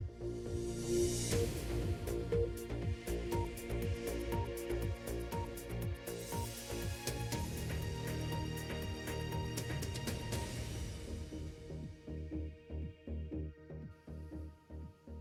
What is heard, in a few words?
BBC News medium music